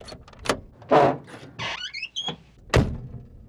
Vehicle Door Old Open Close; Vehicles; Doors & Keys

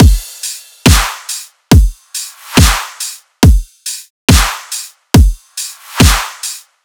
Riddim Drum Loop 140 Full

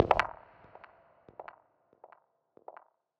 Squelch G# 140 BPM, Psytrance; Ghosthack; Psytrance Visions Volume 2

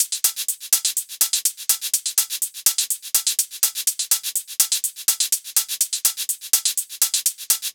Melodic House Kit 124bpm Top; EDM Drums; Drum Kits; Stems; Ghosthack; Ultimate EDM Drums

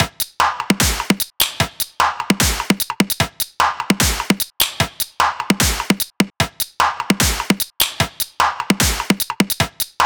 Perc Loop - 150BPM; Dubstep; Drums; Loops - Top; Raptor

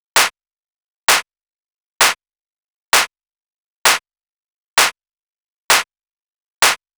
Clap Loop 130, Techno

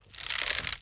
Wood Bow Catapult Creak; Game Weapons; Military; Game Essentials

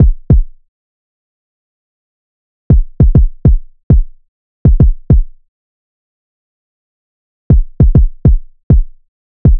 Drum Loop, 100 BPM Kick; Cymatics; Vibes II Drum Loop Collection; Eclipse Drum Loop

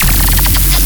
Bass Hit D#, Dubstep; Radiance Bass; Ghosthack; AC2024 - Day 13 - Dubstep Sounds 2025

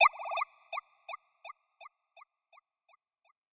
FX Digital Birds

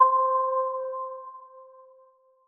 KEYS (C), Pedal One Shots; Complain; Cymatics; ANALOG EVOLUTION Beta

